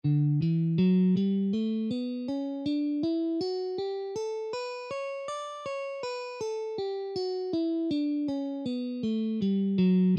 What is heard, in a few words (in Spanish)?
escala de Re mayor posición 5